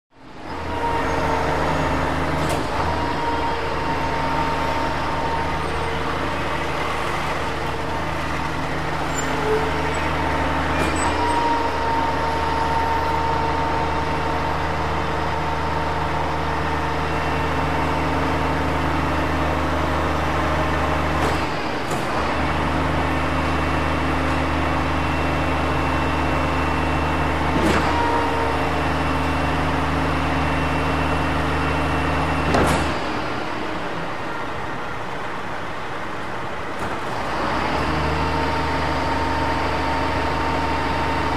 Garbage Truck Medium

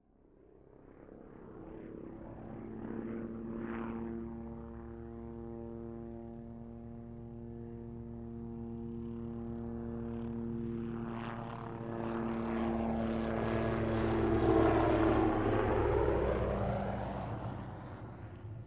OH6 Loach By Distant, little bird

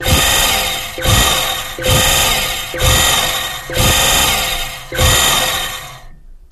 Jet Boat Water Pump Th, boat thrust

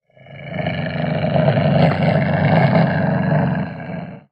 Ocelot Growl Clenchd

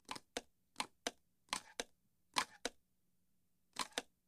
Card Shoe Clicks Deal, casino games cards

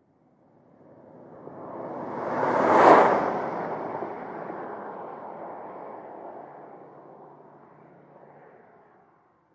Sm Car By LR 100mph, compact

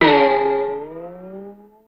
Boing Twang Effect

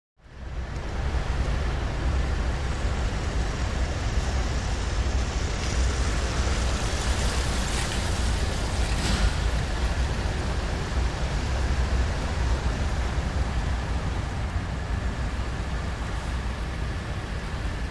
Wet Traffic Interior

